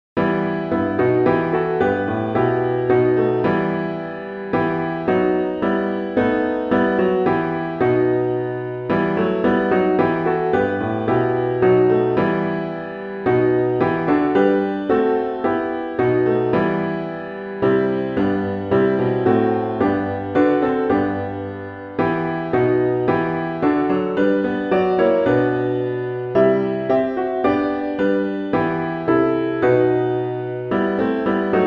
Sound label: piano all parts; psalm5